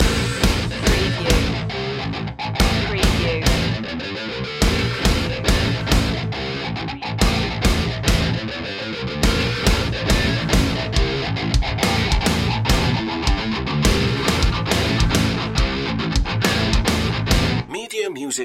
melee master loop

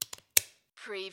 stapler stapling

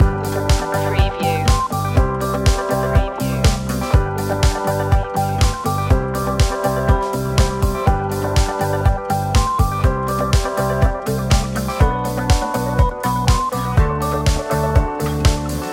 hopeful loop

